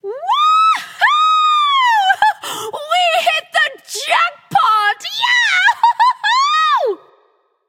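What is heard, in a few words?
yay, female; smite; voicepack; hera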